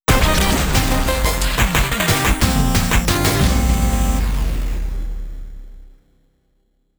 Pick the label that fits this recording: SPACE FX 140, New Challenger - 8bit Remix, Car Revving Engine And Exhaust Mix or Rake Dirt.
New Challenger - 8bit Remix